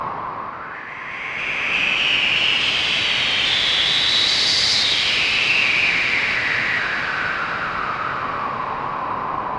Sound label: Cyber Wind; Zero-G - Future Wave